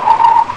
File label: Skid Stop, Car Skids; Transportation